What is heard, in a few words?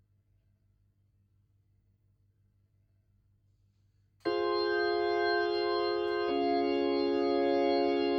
Hammond Leslie